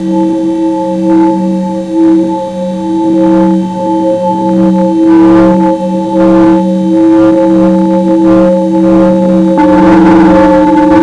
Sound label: bowl, tibetan